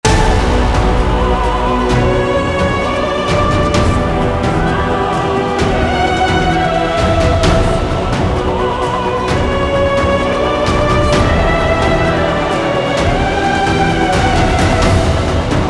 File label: Loop Action; Volume macos ps vita ps4 windows gamerip 2015